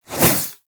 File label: Warfan Whoosh, Weapons Melee; Warframe